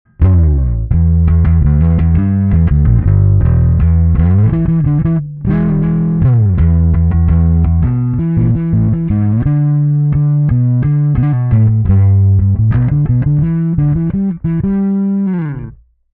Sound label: Alpha Omega Ampeg drive